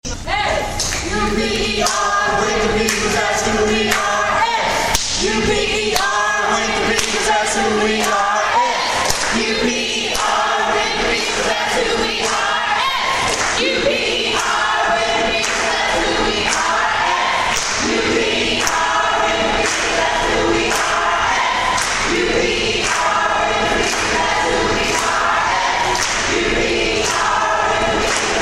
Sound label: Super Eagle Cheer